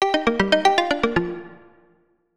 Alert; Tyrannosaurus Alert; Sony Ericsson; PlusFourSix; Tyra (G900)

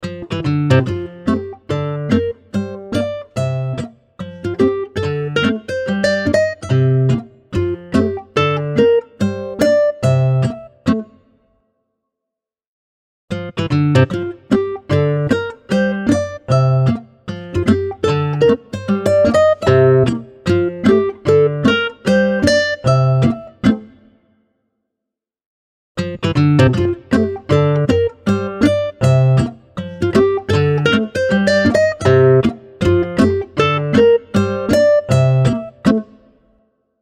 Joyful Endless Guitar, ringtones; LG K92 5G

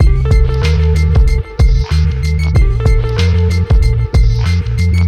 Hiphop, ringtones; Google; NewWave Labs

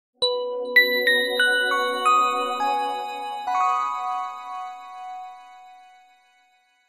Morning, alarms; Alcatel; OT-991D

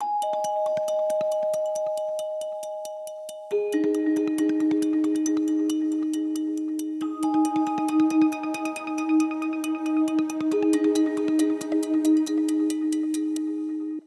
system ringtones abstract

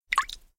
Droplet close; Nokia; 8600 Luna

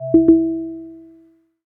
lowbattery; Realme 8

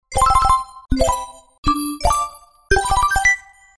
Beam Ring; TCL; 10 SE; ringtones

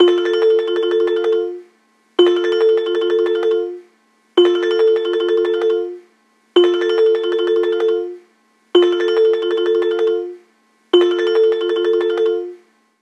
ringtone trills; The Light Phone II

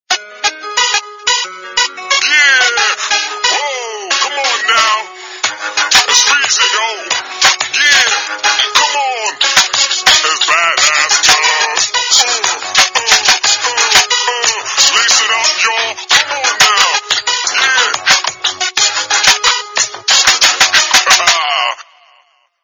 Hip Hop Guy; Samsung SCH-M569

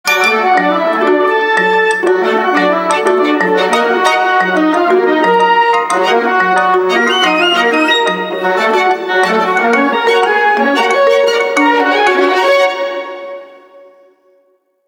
Calendar Leggiero; Vertu Constellation Quest

